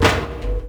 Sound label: Factory Noise; Zero-G; Soundclash; Acidized Wavs